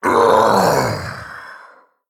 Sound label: Male Golem Death